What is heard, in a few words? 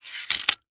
Stand C Stand Extending The Legs; AdobeCC